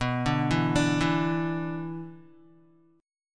B-Minor Double Flat 5th, Guitar

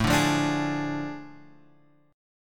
A Major 7th Suspended 4th Sharp 5th; AM7sus4#5 Chord {5 5 3 X 3 4} strummed

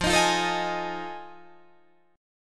F 7th Sharp 9th, Guitar, Open D

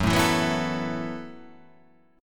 F sharp Minor 11th, guitar

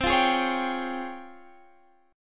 Db Major Sharp 11th C

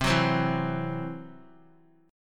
C-Major Flat 5th, Guitar; strummed

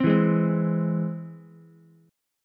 C Augmented down Ukulele; strummed; JGuitar

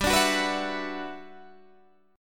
G Major 13th, Guitar DADGAD

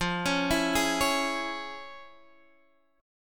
F Minor Major 7th Sharp 5th Guitar Open G

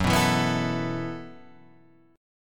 A Suspended 2nd, chord; Asus2/F#; strummed; 2,2,2,4,5,5; JGuitar

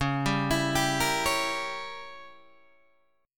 C sharp Diminished 7th, C sharp, Guitar, Open G; down; chord shape

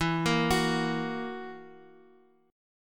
E Suspended 2nd Flat 5th E down Guitar; arpeggiated; JGuitar

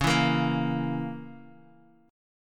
Db Minor 6th Db down Guitar Open D

